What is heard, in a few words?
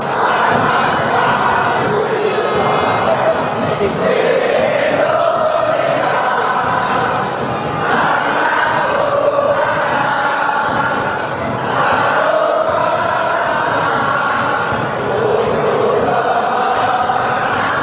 salam satu jiwa, fanchants